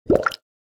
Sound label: Cartoon bubble